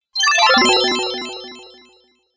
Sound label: Fairy Magic Spell; Magic Sound Effects